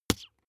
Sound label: bath duck hit soft